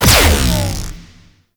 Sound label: Space Fighter Support Drone Shield Destroy; Corpus